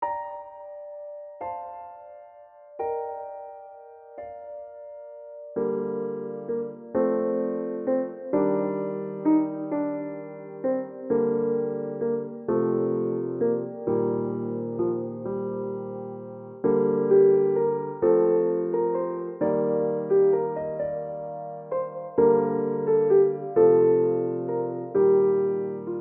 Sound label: PIANO